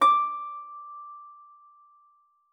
HARP C#6; MF; Xsample VOL-8

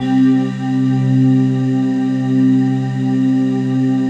digi pad, Pads; Best Service; XXL 800 Pads; Sample CDs

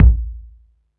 BD SOFT, Techno 138 BPM; Best Service ProSamples vol.54; AKAI

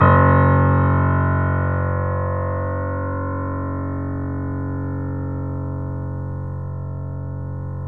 F#, Boesendorfer, 3D Pianos; E-MU Producer Series Vol. 5; 3-D Audio Collection

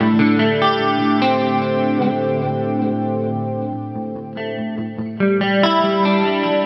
guitar, stringed instruments

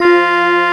bell, ambi; WORS STATION; AMBI BLEEP

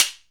PRC SLAP, Orchestral Perc; Roland LCDP03 Orchestral Perc; Orch Combo 1

